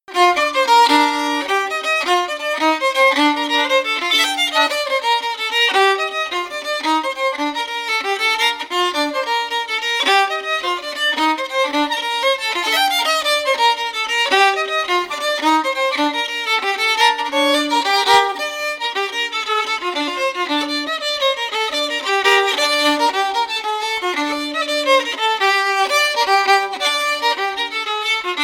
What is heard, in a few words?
fiddle; kings favourite; SeamusGrantFestival